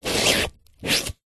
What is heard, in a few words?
sport soccer ball flat scrape concrete; zapsplat